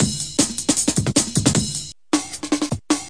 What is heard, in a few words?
155 suburban, drum loop; 141 to 160 bpm